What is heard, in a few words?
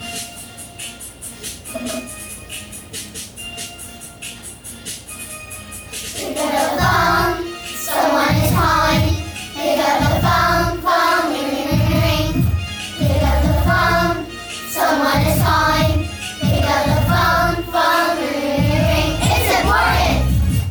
ringtone